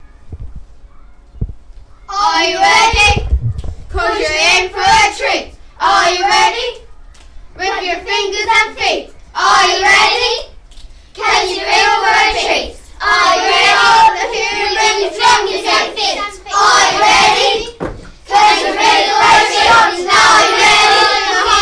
human drumkit round

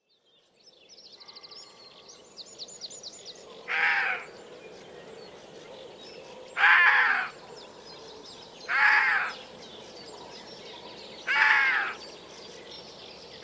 red deer fawn alarm call

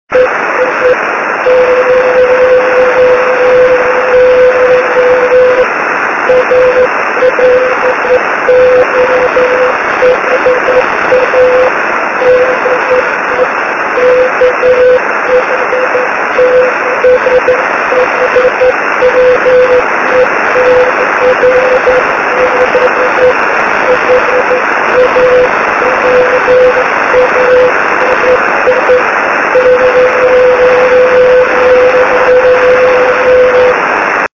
6m beacon; kh6hme

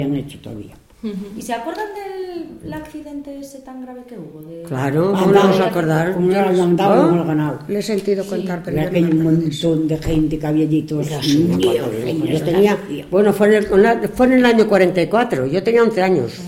train accident song by spanish ex miners

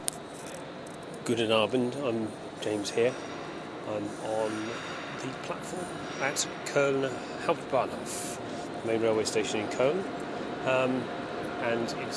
pointy fronted ice train in koln

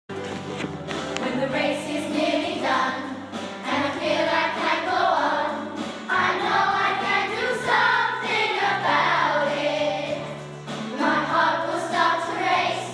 choir practice

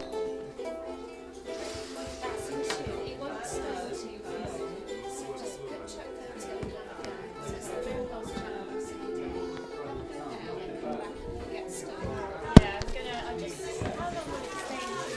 ukulele tuning

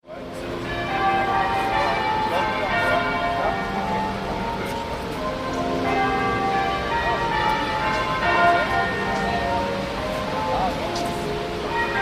St Pauls bells and reverb from city skyscrapers

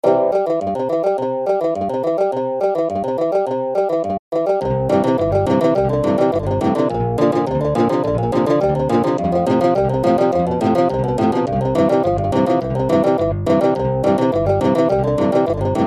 traditional bluegrass banjodoline; syntheway; octave mandolin

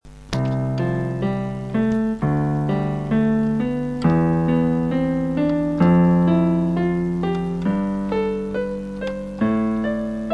amateur keybord song; germany

